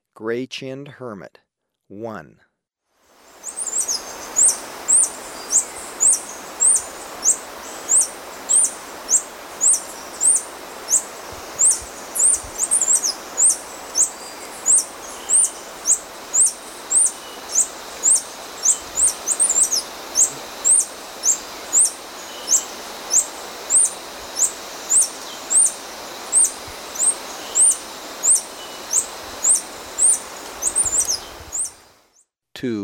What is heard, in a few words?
Gray-chinned Hermit, Birds of Ecuador; S. America